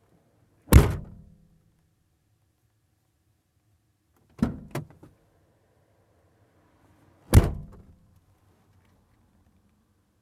Auto Tuer schliessen

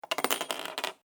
Muenze auf Tisch

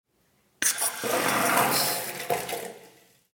WC Spuelung

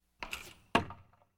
Pfeil trifft auf Holz; Sport

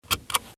Auto anschnallen

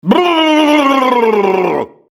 vox mann schuetteln

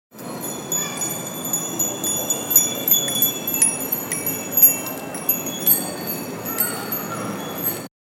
fahrraeder

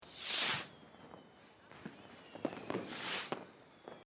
Silvester Zischer

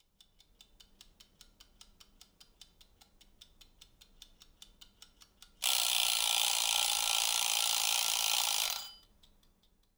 Uhr Klingel

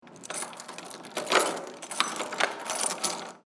Tuer aufschliessen

